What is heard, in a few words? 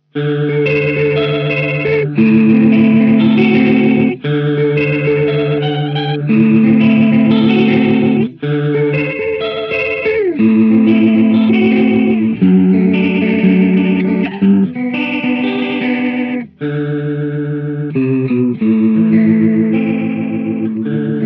rotary